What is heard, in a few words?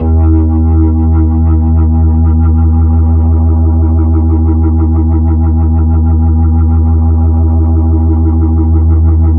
HAMMOND; 3D Sound; 3D Keys VOL-1